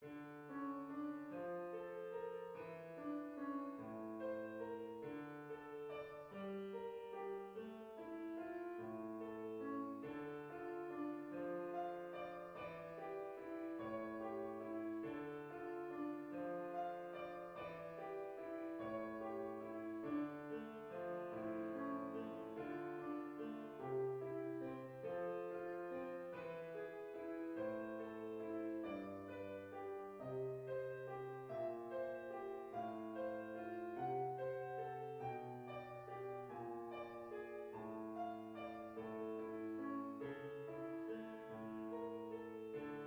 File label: Lacrymosa piano